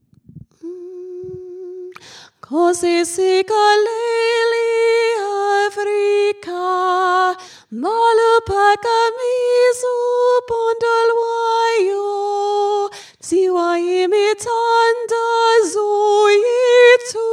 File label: soprano; nkosi